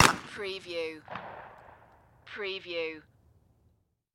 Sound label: Shotgun Krieghoff; sound effects bible